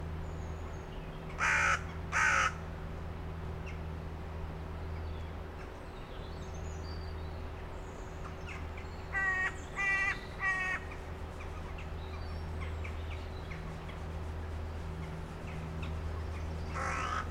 crows crowing in cemetery Kingston London England; Bonner Hill Road

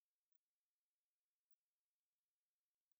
Myotis sp broadband, bat

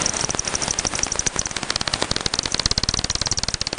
Hogsmill River two bat species; Hogsmill Lane

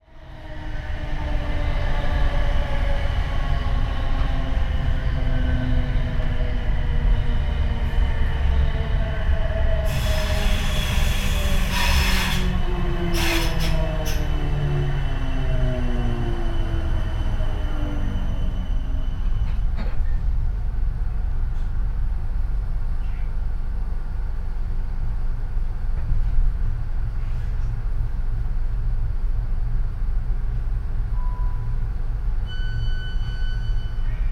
Weymouth by train via Waterloo

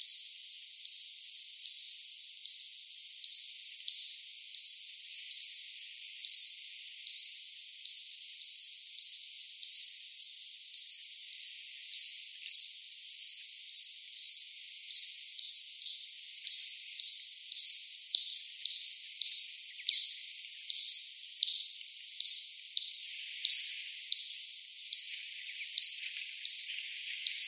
Daubenton, 10x slow, bat

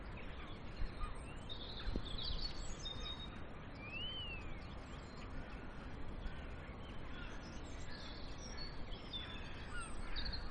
bird calls gull jackdaw crow; cemetery

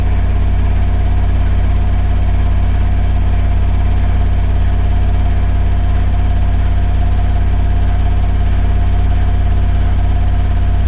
gentle spin during rinses; ZWF01483W

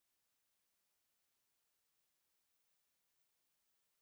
Daubenton 30kHz highpass, bat

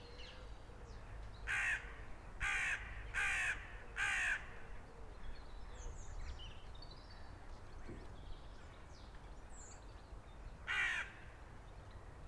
crows crowing in cemetery Kingston London England; Bonner Hill Road

